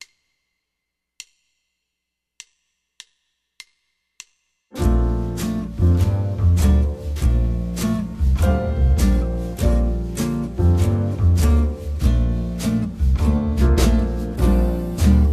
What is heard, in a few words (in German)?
4 takte cmaj7 tenor, saxophone